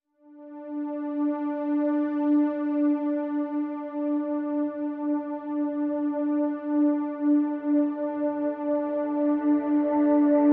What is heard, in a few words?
Pads Vapors (D)